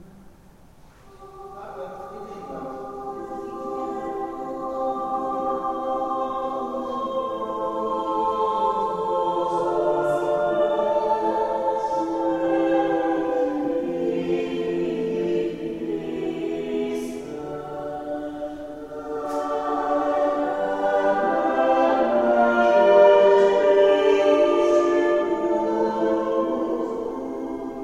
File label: Adoramus te Christe, a capella in the church; Lassus